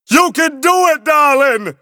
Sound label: grooves positive; tf; a hat in time